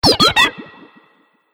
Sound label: Robot Shrinking Laser Ray, sci-fi robot sound effect